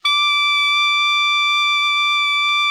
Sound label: ALTO MF D 5, Saxophones; Greytsounds; Philip Wolfe David Crozier's Saxophones